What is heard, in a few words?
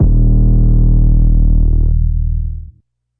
808 Long; drums; single hits; No Way 808 Long; Khezie 808s